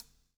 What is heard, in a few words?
COPS STK; Spectrasonics - Backbeat